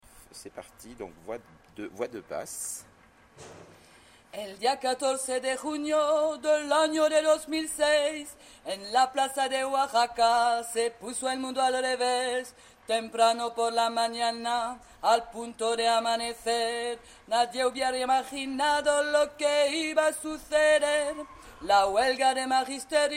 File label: Son de la barricada basse